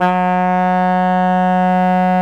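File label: soprano sax G#2, sus loud; Giga Samples Collection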